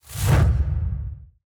Mass Destruction Attack Whoosh

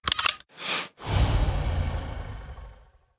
Gunshot Warning Idle; Devour; The Town

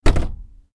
Rail Switch; this is the zodiac speaking 2020 gamerip switch ps4 xbox one pc macos

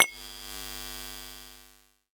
Bulb Switch

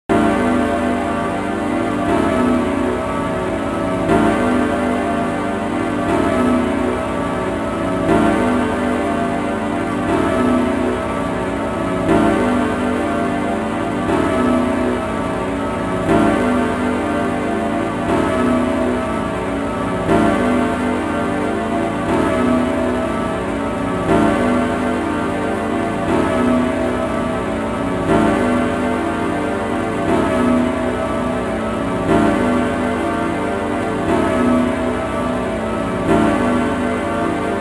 Radio

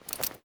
movement cloth; adams venture origins

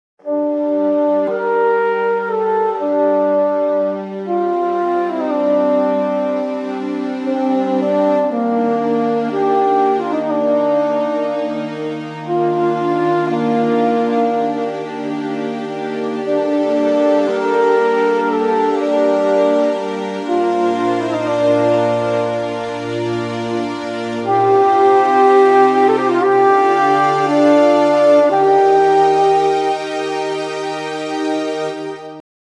Forest Song; Death's Door; Forest Song Start

